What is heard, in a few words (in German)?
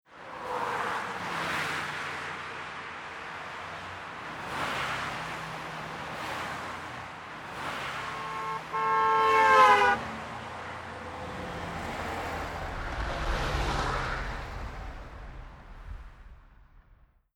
autobahn